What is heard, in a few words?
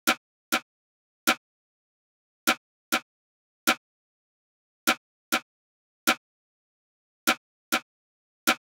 Drum Loop 100 BPM Percussion, Hip Hop; Drum Loops; Loop Stems; Cymatics; No Se Nada